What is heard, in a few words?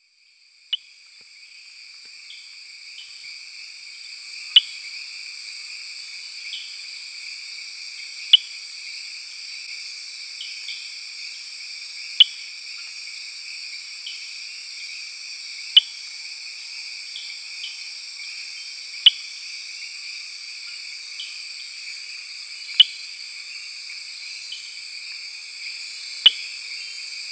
Eleutherodactylus inguinalis